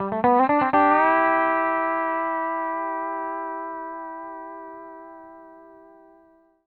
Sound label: LOOPS 120, Country